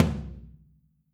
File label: FLR TOM OH; Q Up Arts; Denny Jaeger Private Collection